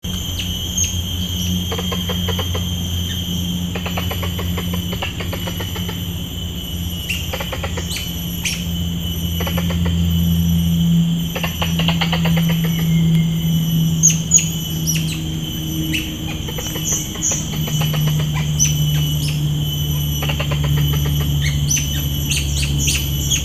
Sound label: plantain squirrel, Mammal calls; Callosciurus notatus; Sungei Buloh, Singapore, 11 Jan 2018, 1215 hr